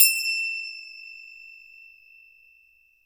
FINGER CY R, Synclavier Percussion; ILIO; Synclavier Percussion 2; World & Orchestral

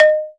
BALOFON

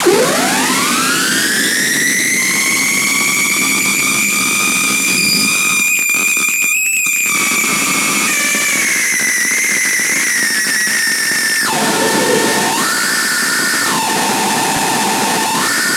thrusters; Spectrasonic Distorted Reality 1

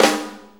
4-4 Snare Flam, Drum Loops

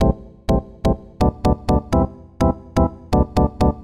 Organ chops (125BPM), retro house

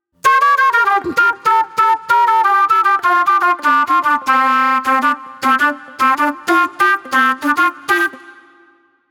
Acid Jazz Flute; Sonic Foundry (Sony Creative Software); Manhattan Flutes; Smooth Groove

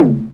Tom, Drums; Bitkits

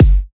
Hard Kicks F#; Antidote Advent

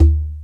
CONGA SET; OMI - Universe of Sounds

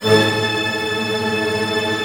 STR TRM D#, STRING TREM; Sound On Sound - Peter Siedleczek's Orchestra

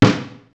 Snaredrum, Korg T3

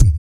WOOF DOWN, Vocal Blues Soul; Spectrasonics; Planet

